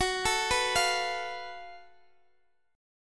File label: F sharp Minor Major 7th Double Flat 5th, Guitar Open D; chordshape; down